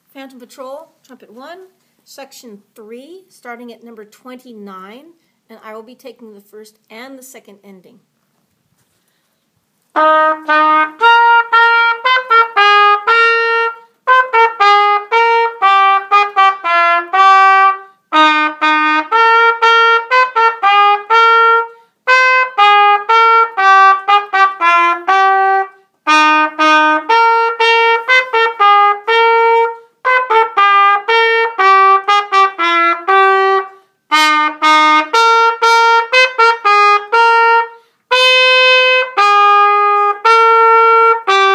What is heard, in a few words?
Trumpet; Cornet